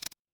ui unlock

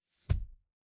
ui drag

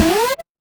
retro game power up

